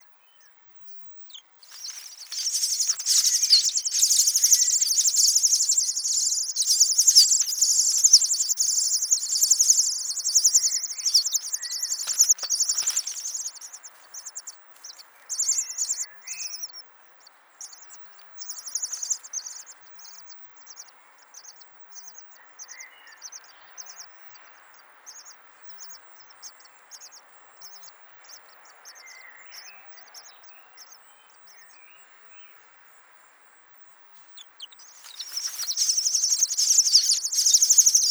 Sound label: Dunnock